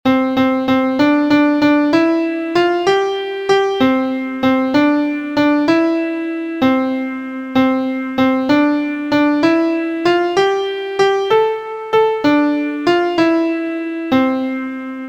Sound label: MIDI